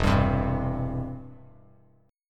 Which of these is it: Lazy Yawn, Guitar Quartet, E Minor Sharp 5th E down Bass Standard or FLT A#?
E Minor Sharp 5th E down Bass Standard